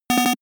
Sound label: feedback negative 8bit